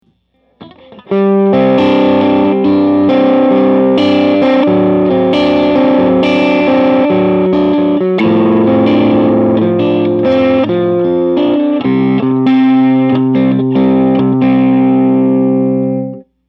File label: Modern Jazzmaster® Middle dirty